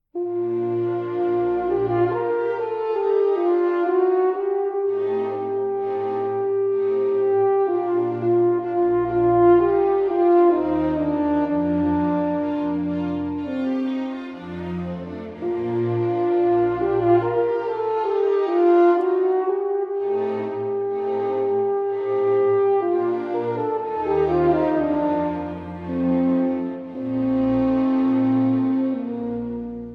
Concerto for Horn and Orchestra in E flat Major, Romance, Adagio